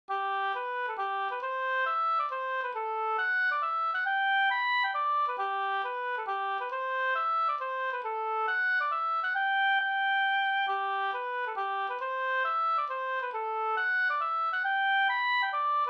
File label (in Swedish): mazurka